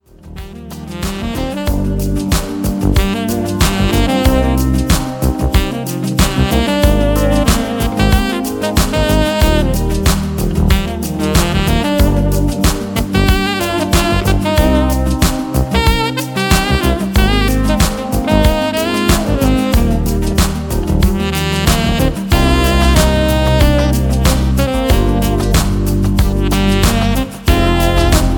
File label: Sax